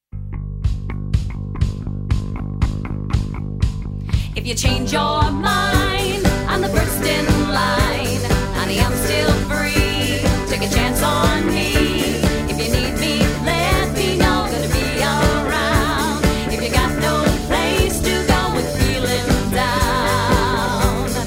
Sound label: Tribute Band; Seattle